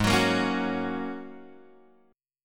G sharp 7th Suspended 2nd Suspended 4th, chord; G#7sus2sus4 Chord {4 X 4 3 2 2} strummed

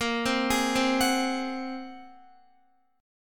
A sharp 7th Suspended 2nd Sharp 5th, Guitar; arpeggiated; JGuitar

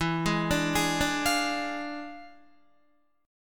E 7th Flat 9th, down, Guitar; E7b9; arpeggiated

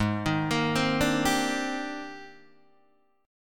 Ab Major Sharp 11th Ab, Guitar DADGAD

